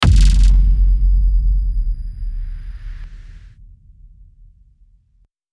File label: Impact, transition